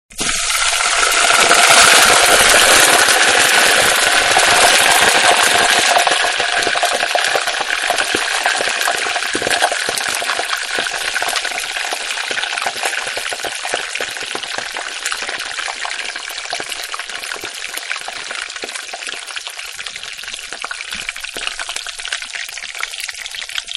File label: Frying High, Deep Fat; Food Prep (Sound Effects:Restaurants); Frying-Deep Fat-High